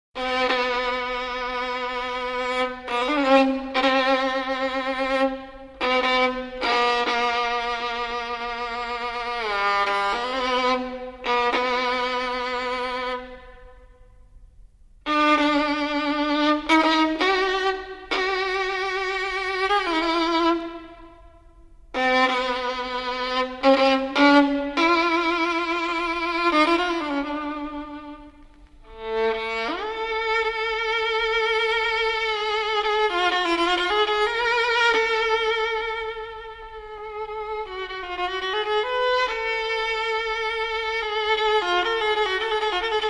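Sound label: Concert Rhapsody in D Major for Violin and Orchestra; Tzigane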